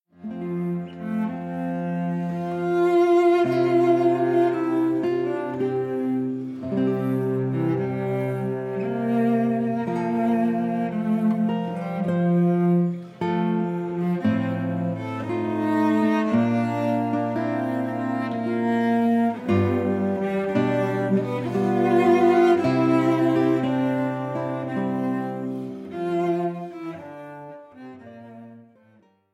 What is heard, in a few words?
Cello Consort Largo; Italian; Sonata n.2